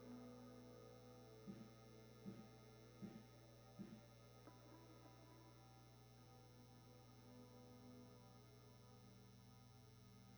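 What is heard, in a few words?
SM57 mono Guitar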